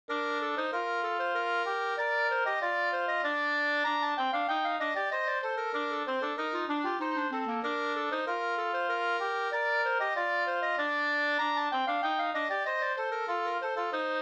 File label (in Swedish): slangpolska